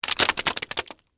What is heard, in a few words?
Splat Debris Juicy, Long; Texture; Splats (Sound Effects:Objects); BlastWave